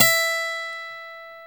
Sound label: OVATION A GT E4; AKAI S-Series CD-ROM Sound Library VOL-7